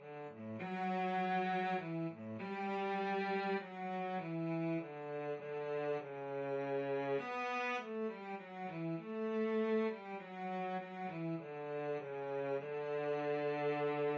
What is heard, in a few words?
cello trio; dona nobis pacem